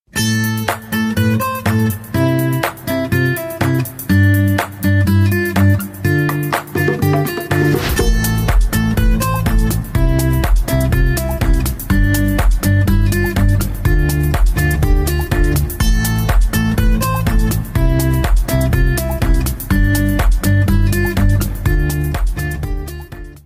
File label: Cover